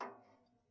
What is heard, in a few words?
Ping, Kitchen Toaster